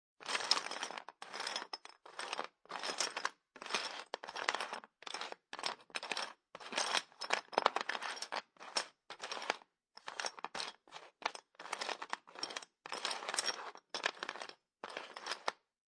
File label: Foley, Plastic Board Game Pieces Shuffling Inside Cardboard Board Game Box